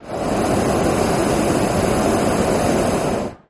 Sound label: Hot Air Bllon Burn Sm, hot air balloon